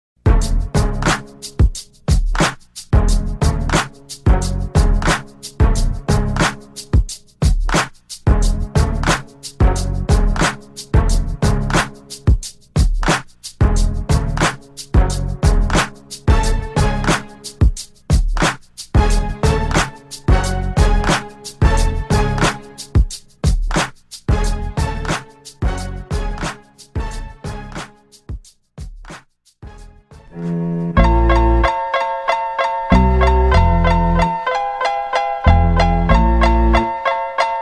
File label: West Coast Drum Kit